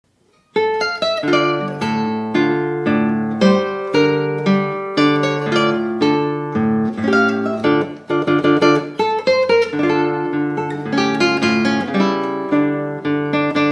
Guitar

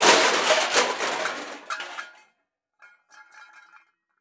Crash Metal Objects Tossing On Cement; Crashes

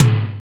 Loop

